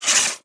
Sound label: make linen; Immortal